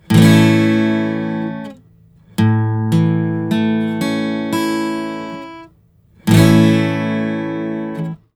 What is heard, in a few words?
Major or Minor